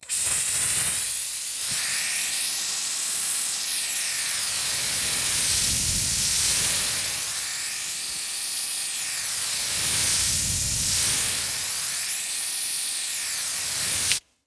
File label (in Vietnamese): Âm thanh phun sơn xịt sơn bằng dụng cụ